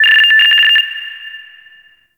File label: Interior Orbital Station Telemetry High